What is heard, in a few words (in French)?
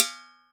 casserole; In the kitchen; 96khz 24b mono